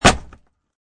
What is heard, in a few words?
Piece of wood is bashed; Bashing; Objects